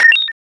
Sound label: fighter plane click